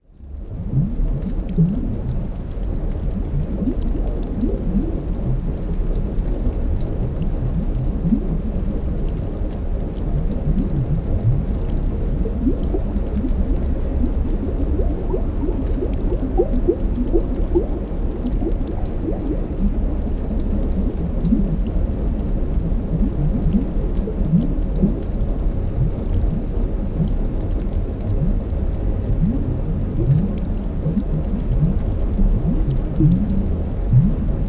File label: Underwater Air Bubbles Riding To the Surface of the Ocean; Dr. Sound Effects; 210 Nature Sounds - 20 Hours of Relaxing Natural Ambiences for Meditation and Sleep